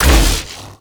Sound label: Contamination Wave Proj Impact; Warframe; Focus; Madurai